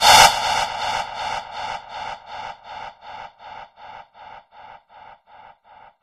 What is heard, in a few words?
shock; animal